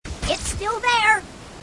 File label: grunt; h3dialogue; grunts